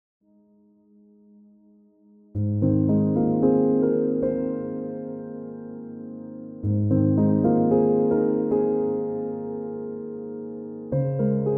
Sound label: chill piano version